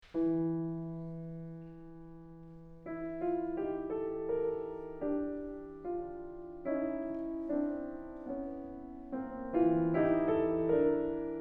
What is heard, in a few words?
Solo Piano, Arietta